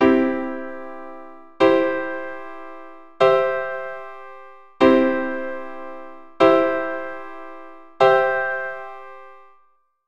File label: Inversions, keyboard